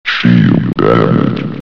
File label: pain, flamebot